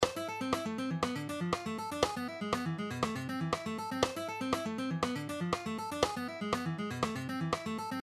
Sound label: String Skipping Exercises, A Harmonic Minor; Original speed; Frank Gamble’s Chop Builders